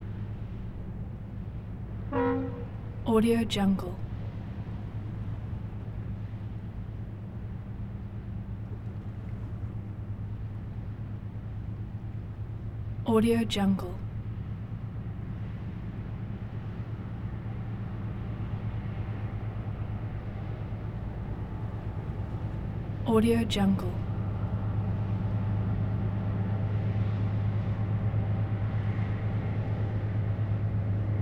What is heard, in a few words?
tugboat pass by